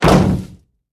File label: Humans Damage